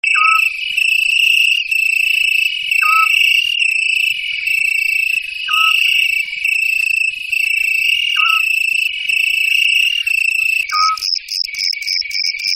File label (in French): chant petit duc